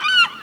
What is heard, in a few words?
seagull idle, creatures; Counter Strike Model Pack; CS:GO